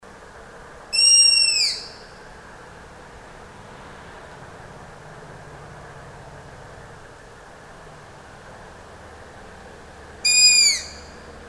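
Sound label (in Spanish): Lechuzón Orejudo, Asio clamator, Striped Owl; cantos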